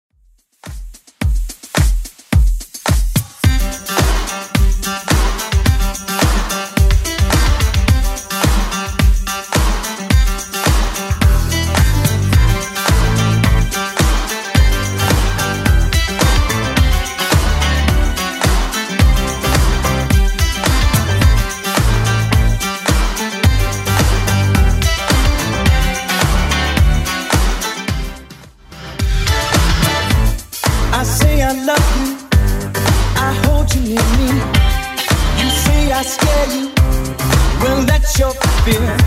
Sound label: 80s Club 108 bpm